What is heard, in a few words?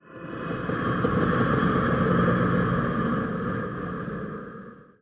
amb prepper rhythmic, ambient; Half Life Model Pack